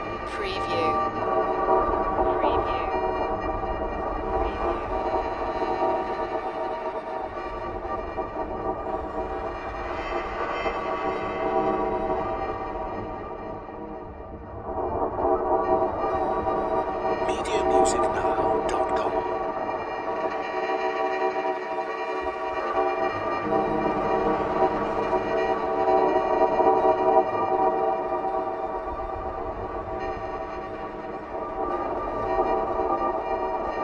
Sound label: whiny haunting ambience loop